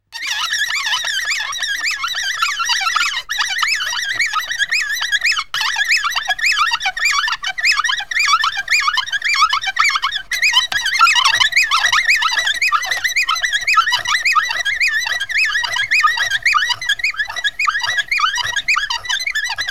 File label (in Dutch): snijbonenmolen